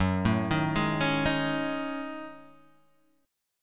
Gb Minor 6th Add 9th Gb down Guitar Open G

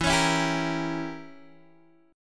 E Diminished 7th E, Guitar Open G

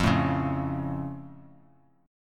F Augmented F down; strummed

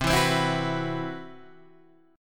C Major 7th Flat 5th C, Guitar Half Step Down; CM7b5

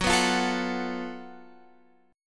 Gb Minor Major 7th Double Flat 5th, Guitar Open F; strummed; x,13,11,12,12,13

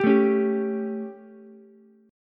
G Suspended 2nd Flat 5th G down Ukulele; Gsus2b5 strummed; JGuitar

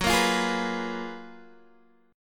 Gb Major 11th Guitar Open G

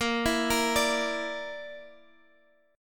A sharp Major Flat 5th, Guitar, Half Step Down

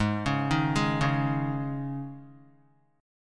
Ab Suspended 4th chord, Guitar; 3,4,3,1,1,x; 4F,4A,5C,5G,5C,6E; arpeggiated; JGuitar

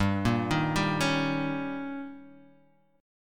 D sharp 7th G, guitar

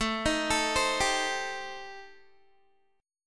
A-Minor 7th Flat 5th, Guitar, chord; Am7b5; arpeggiated

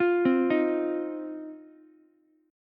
C Minor Double Flat 5th, Ukulele; arpeggiated; 10,12,11,x; JGuitar

